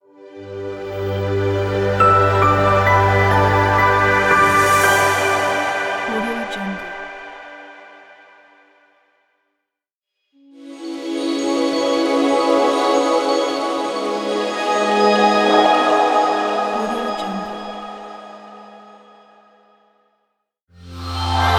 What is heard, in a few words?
the intro reveal logo pack